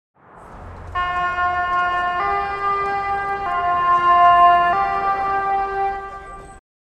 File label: Fire truck siren